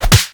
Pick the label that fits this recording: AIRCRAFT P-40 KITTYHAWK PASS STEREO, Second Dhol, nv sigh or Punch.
Punch